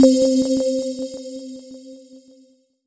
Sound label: Korg TR Rack Sun Bells C4